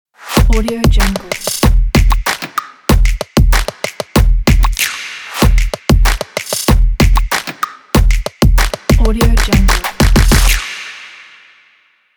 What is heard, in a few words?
stomps sport logo; seatools